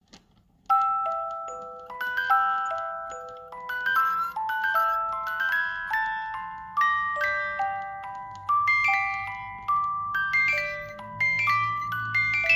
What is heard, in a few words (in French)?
18lames, boite a musique; Boîte à musique en bois personnalisable